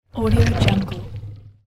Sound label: alien growl